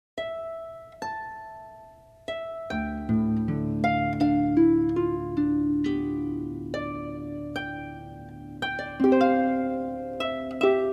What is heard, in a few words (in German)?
Harfen